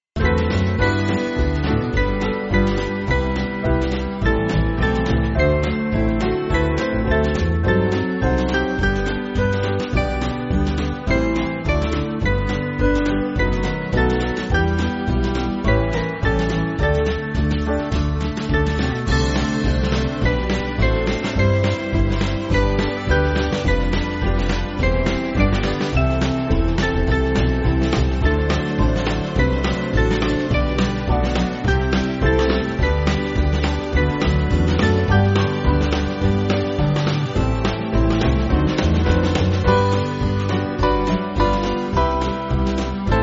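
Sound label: Band, Backing Files for Churches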